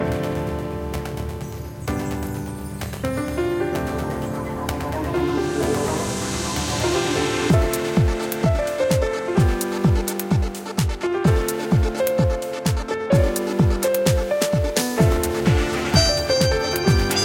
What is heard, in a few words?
Presto Digesto! (Gameplay); Cooking Dash